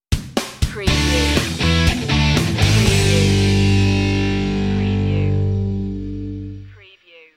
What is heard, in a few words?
rock sting